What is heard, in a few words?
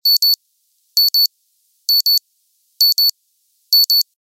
Alarm Clock; Lenovo; P700i; alarms